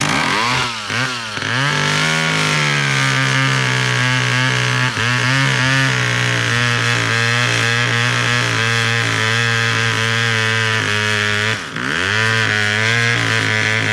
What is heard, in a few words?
Chainsaw Cutting A Tree Loop